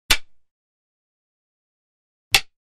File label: Stick Hit 2x, spears & staffs